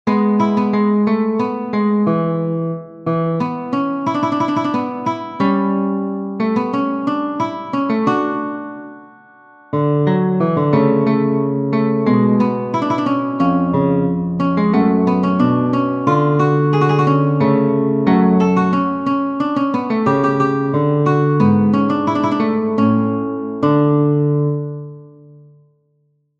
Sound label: recitative for classical guitar; bach johann sebastian; ging hin der zwa lfen einer